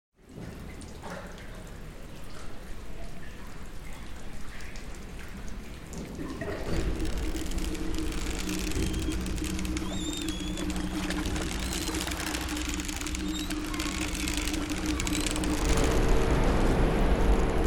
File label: binaural soundscape; 11 minutes long; sonorous mood; from carlos suarez